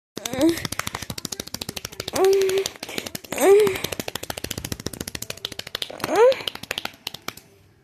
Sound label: clapping